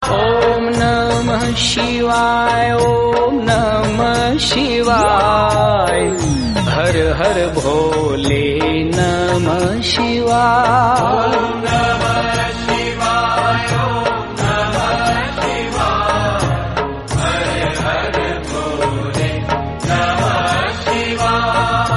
Om Namah Shivay Mantra Ringtone

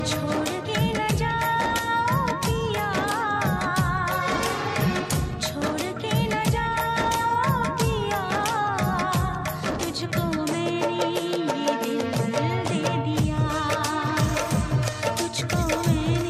Female Heart Touching; ringtones

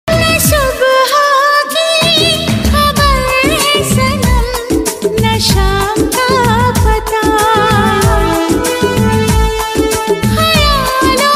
Romantic Female Song Ringtone

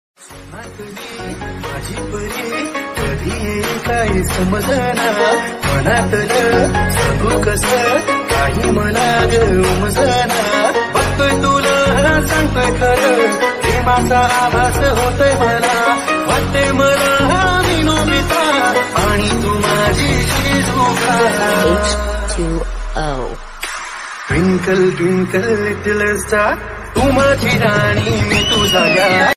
Marathi Love Mashup

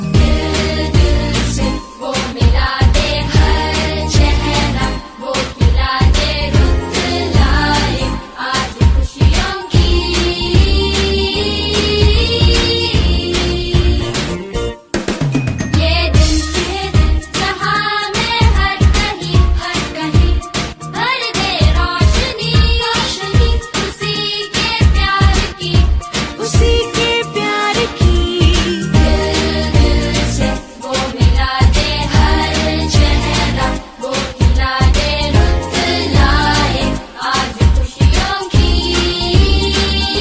Diwali Song Ringtone

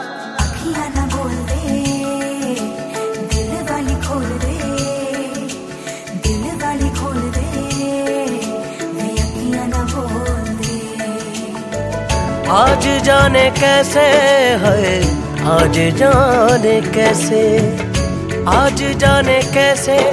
Romantic Love Ringtone; Valentaines Day

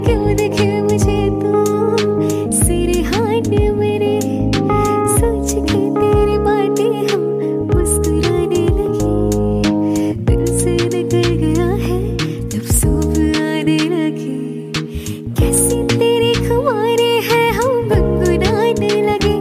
Love Song Female Version Ringtone; ringtones

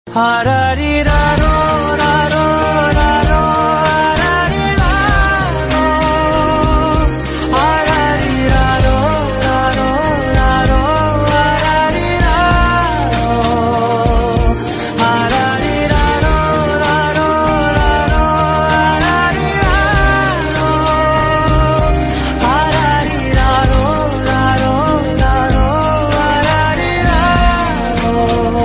Tamil Ringtone

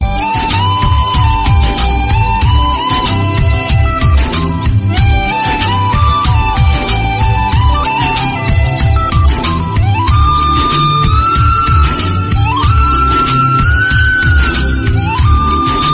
Tamil Ringtone; Ponniyin Selvan

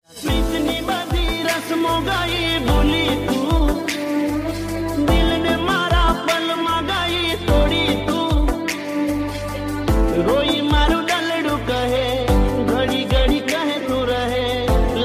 Gujarati Song Ringtone

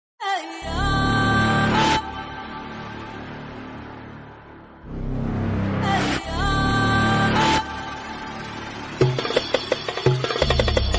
Bgm Theme Ringtone; Beast; Vijay Thalapathy

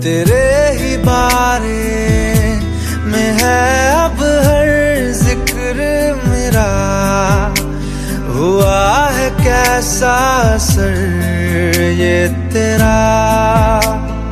Hindi Love Song Ringtone

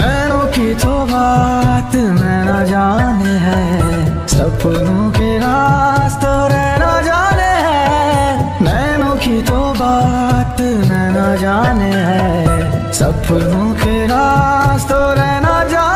Hindi Song Ringtone

